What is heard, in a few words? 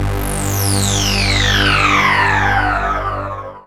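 synthesizer; Best Service Gigapack 1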